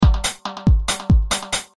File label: 140 tribal